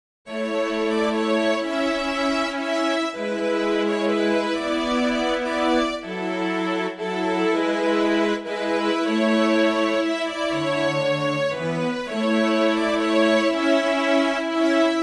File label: yamaha tyros5 chamber strings